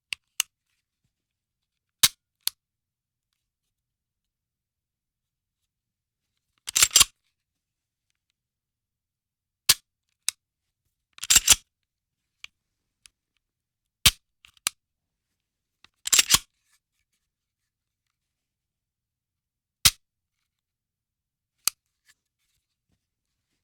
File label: handgun dry fire